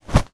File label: cloth Hit